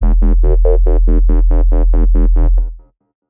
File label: Bassline, Dubstep; GhostHack